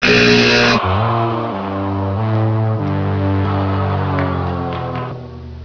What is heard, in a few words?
time up